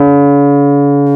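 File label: Rhodes Hard C2, Rhodes 73 Suitcase piano; Keyboards of The 60's and 70's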